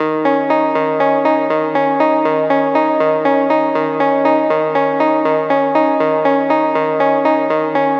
Serum LD

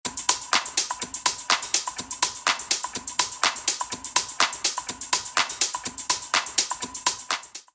top loop Bm 122

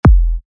kick melodic techno E

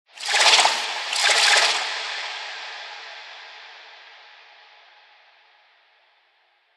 Atonal One Shot

